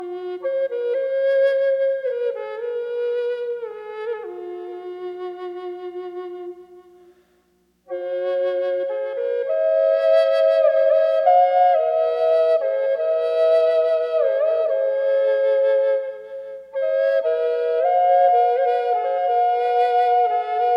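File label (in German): Triple Fis, indianerflöte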